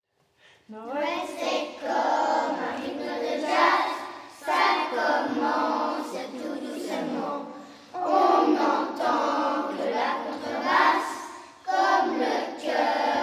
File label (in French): Noel Jazz